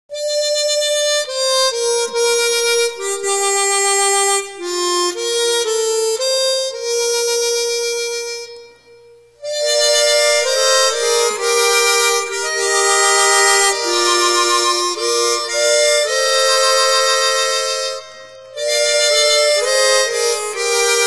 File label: Nearer My God To Thee Chromatic Harmonica